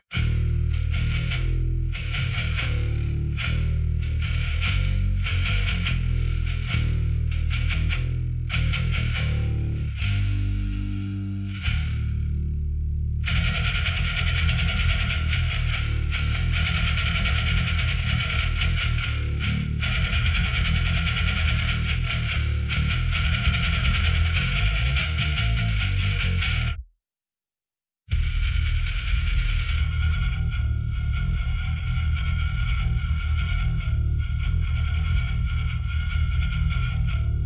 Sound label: Bass